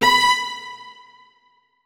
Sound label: notes, keyboard; Hideout Furniture